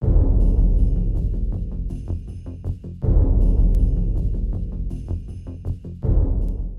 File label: Doors Open